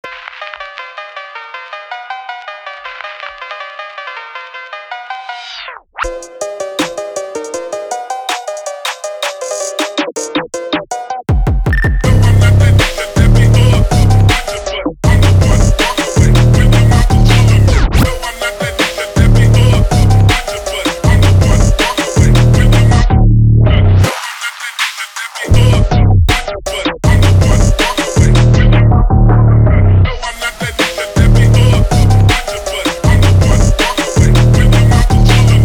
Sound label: phonk